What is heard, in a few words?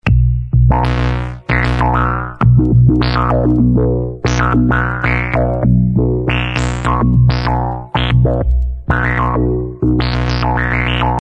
RND SYNTH